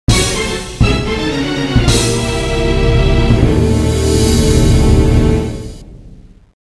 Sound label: failed; The Lost World Jurassic Park; arcade